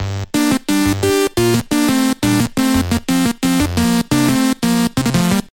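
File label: amazing 8-bit track on Dendy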